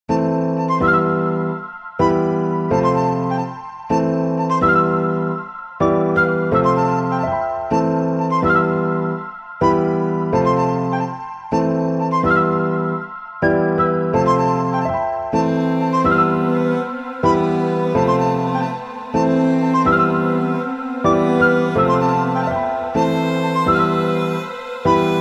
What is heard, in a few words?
Piano Rose W Flute String